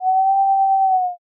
erhu

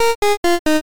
stairs down

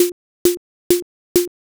footstep wood

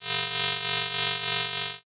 cicada

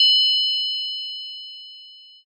triangle

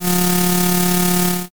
shredder

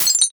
dish break

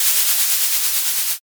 balloon inflate

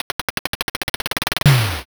drumroll

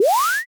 zoom

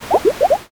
poison

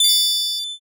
bling

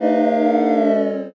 commotion

